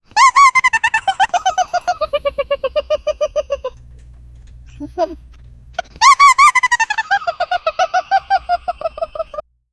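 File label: cartoon cute breath in and, giggle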